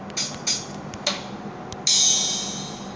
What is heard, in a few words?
Drum